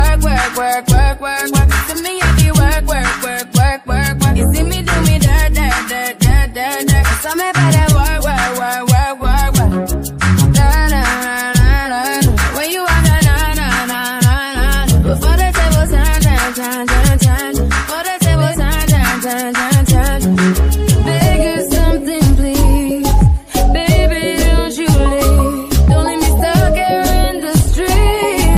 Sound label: english ringtone